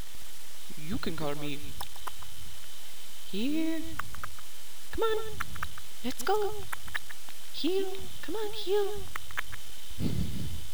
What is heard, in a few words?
knock knock afghan, afghan hound